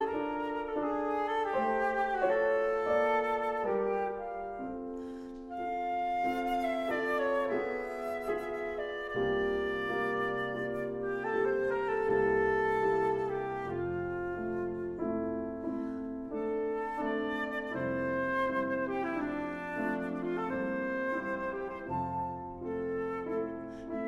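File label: pièce op 189 for flute and piano lent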